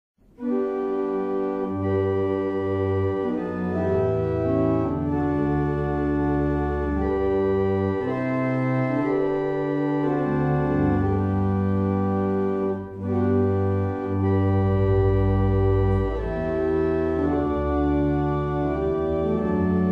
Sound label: Angels Song, barrel organs; Meldreth